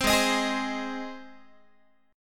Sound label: G sharp Major G sharp Guitar, chord; strummed; 15,16,18,18,16,x